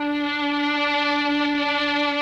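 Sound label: MELLOSTR, Mellotron Violins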